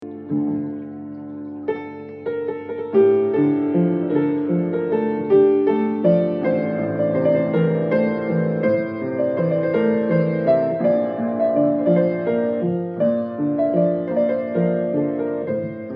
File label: Ba Piano